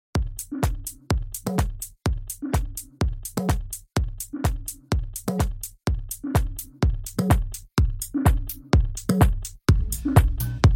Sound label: Beat Organ